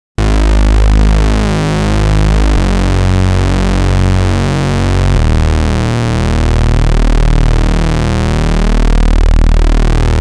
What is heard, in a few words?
minimax triple SAW